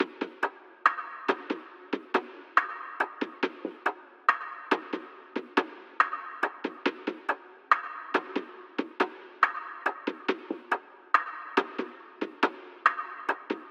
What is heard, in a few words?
Drum Loop - 140 BPM Percussion; Loop Stems; Cymatics; DOPE DRUMS VOL. 1; Mind Games